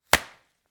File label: slap; Westview Academy